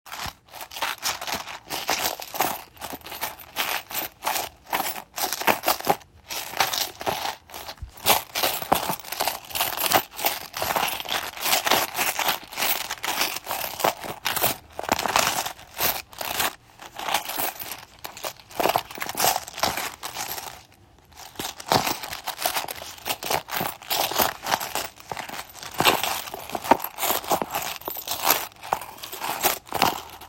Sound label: Coins